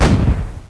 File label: CANNON; CD Action; soultrap